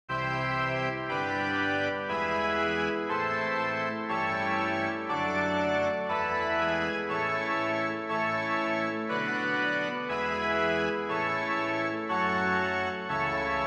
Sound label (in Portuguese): cadencia modulacao